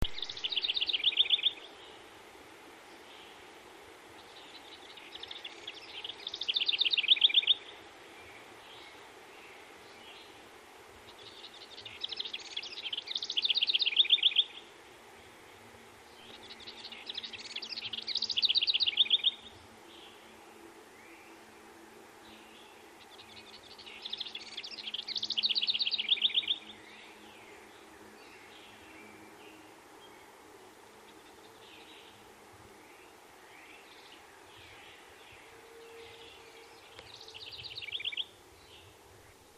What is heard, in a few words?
house wren